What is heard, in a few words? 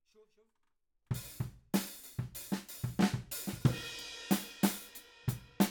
Ride